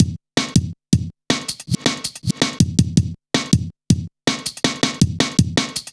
Tinkle, drum loop, jungle, 161.5; Zero-G Jungle Warfare 1, 2 & 3

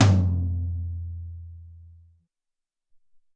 Att Tom L, Alesis SR-18

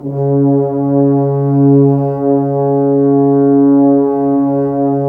BRS, FHns Dry; Roland L-CD702; F.Horns 2

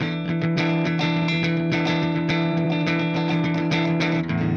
Clean Funk Guitar; Sonic Foundry (Sony Creative Software); Indie Rock Anthems; Session 02 - Clinical

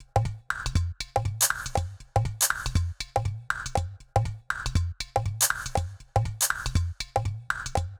Loop 120, Perc Loops

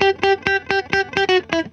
HOT GTR, Roots Disco; USB Soundscan vol.32; AKAI

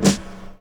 SD, Dance Drums

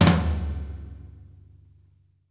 FLOOR FLAM, TOMS; Sampleheads; New York City Drumworks VOL-1; SP TOMS

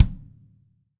DW Green Maple Kick Room; Sonic Foundry (Sony Creative Software); Vital Drums

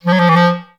F2, orchestra; Best Service; Peter Siedlaczek's Advanced Orchestra

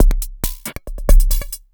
percussion loops, Techno, 138

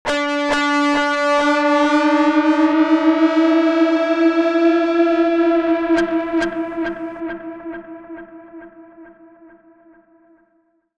FX DIST; FX DISTO

